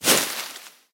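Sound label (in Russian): сбор и подбрасывание сухих листьев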